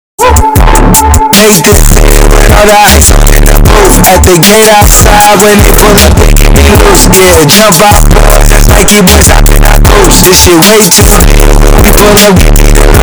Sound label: distorted